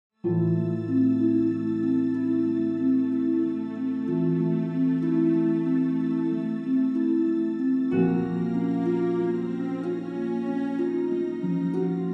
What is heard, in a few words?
Suspense